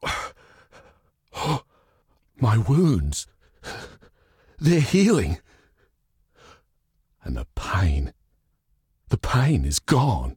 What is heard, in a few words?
pain gone; Bascileus